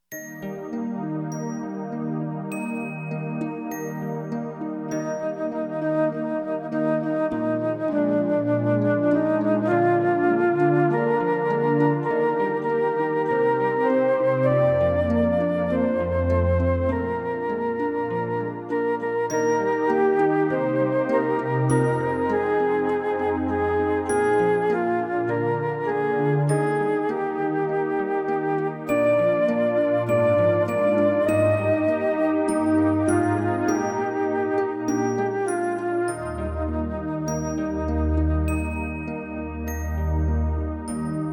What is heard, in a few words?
instrumental; Gloria Patri